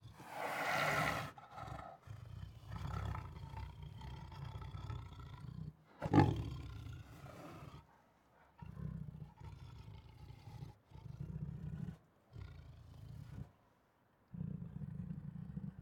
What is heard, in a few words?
Siberian Tiger Protective Growls, Roars and Snarls; Tigers; Sound Ideas